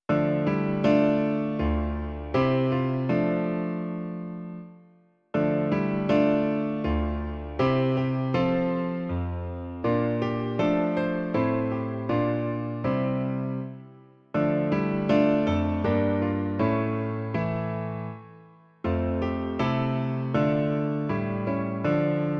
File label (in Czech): klavír, Klavírní verze